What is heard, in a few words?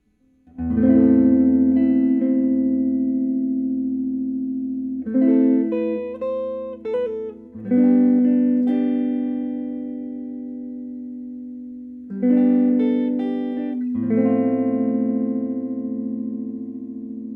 DeArmond TremTrol